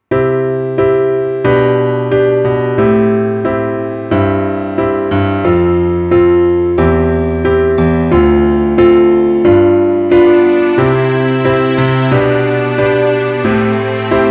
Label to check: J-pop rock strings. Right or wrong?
Right